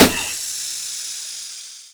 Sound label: Car Tire Pop; Warframe; Nineteen Ninety Nine